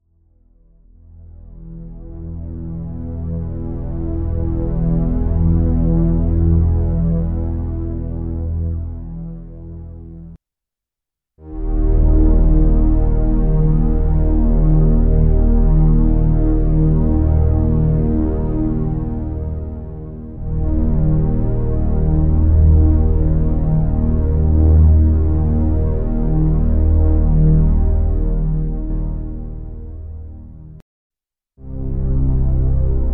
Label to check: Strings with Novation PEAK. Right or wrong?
Right